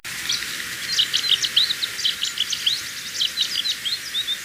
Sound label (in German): Seidensaenger; Schwarzenau KT; Rainer Jahn